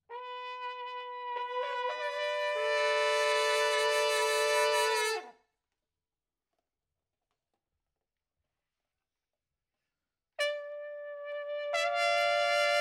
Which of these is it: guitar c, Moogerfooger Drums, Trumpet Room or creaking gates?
Trumpet Room